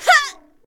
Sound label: Radiant Glaive Death Vocals